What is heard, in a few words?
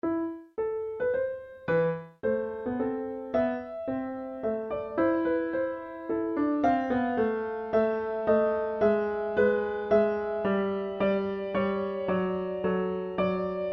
Bach Menuet La Minor